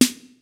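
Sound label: HIPHOP KIT, DRUMS; Kid Nepro; Elite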